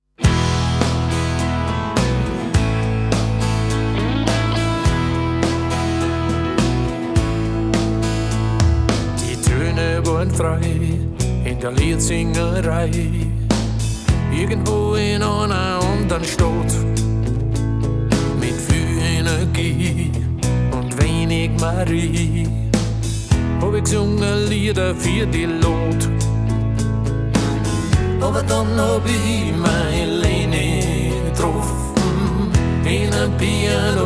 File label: Piano Cafe